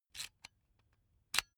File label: electric drill template slide in slow; maschinen; bohrer; soundpool